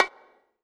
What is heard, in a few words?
BONGO HI, HR-16 Drum Mashines; EdgeSounds